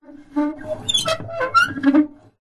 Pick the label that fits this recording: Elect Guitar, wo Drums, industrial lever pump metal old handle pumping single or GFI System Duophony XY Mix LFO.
industrial lever pump metal old handle pumping single